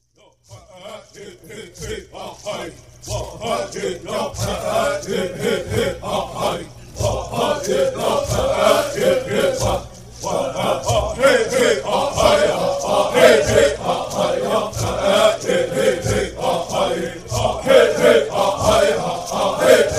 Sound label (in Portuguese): Canto de luta dos Wapte com padrinhos